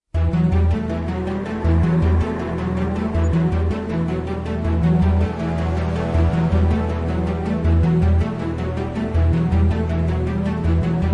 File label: The Kick